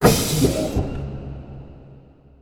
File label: Power